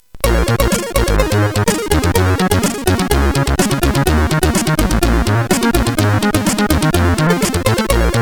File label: DISCO, CSG8580